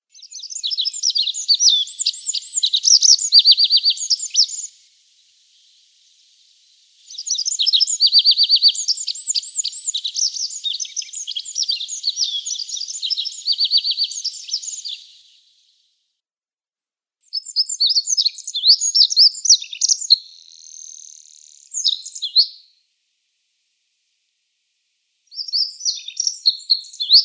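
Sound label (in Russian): Konek pjatnistyj, olive-backed pipit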